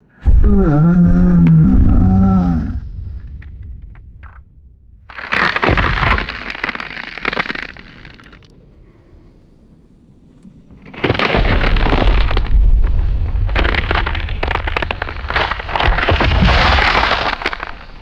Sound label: thick ice cracking and groaning